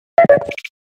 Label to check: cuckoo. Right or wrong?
Right